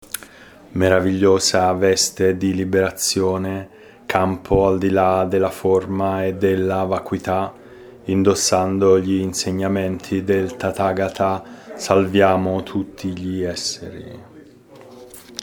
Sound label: Robe chant in Italian